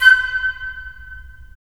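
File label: FL, flute; LA Composer vol. 3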